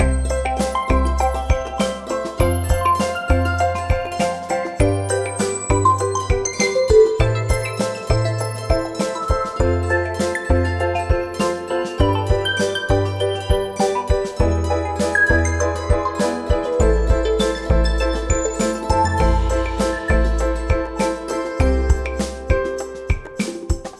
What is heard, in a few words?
Secondary Dominant Summer Song